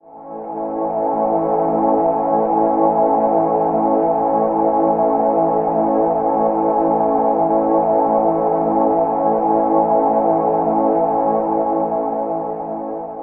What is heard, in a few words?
Major Mist A, Ambient Pads; Zero-G Seismic Frequencies; 90 s Sample CDs